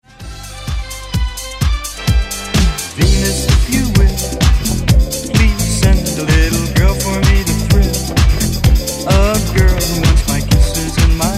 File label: Vocal